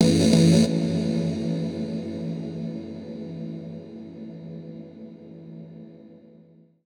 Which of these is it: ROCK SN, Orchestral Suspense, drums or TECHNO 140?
TECHNO 140